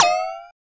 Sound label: Bend Up; FREE SFX PACK VOL 3